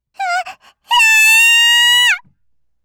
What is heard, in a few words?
kyaa; gaya